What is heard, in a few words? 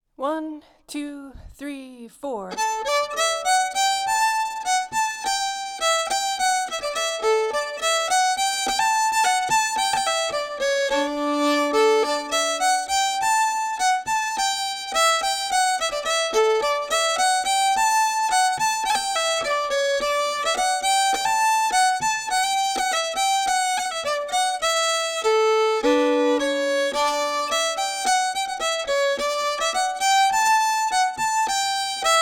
mistwold melody, fiddle